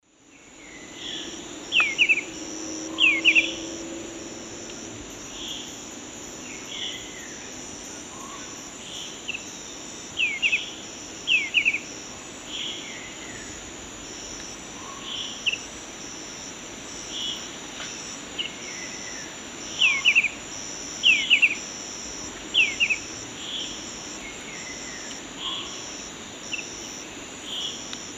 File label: Pachyramphus marginatus, song; S. America; Eastern Lowlands